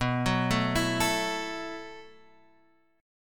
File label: B 7th Suspended 4th B down Guitar Half Step Down